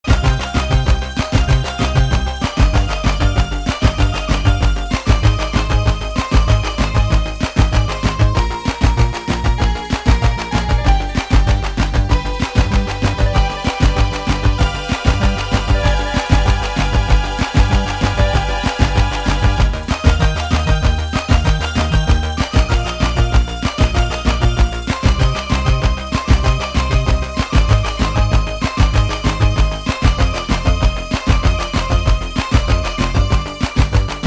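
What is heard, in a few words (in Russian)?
retro muzonchik, retro music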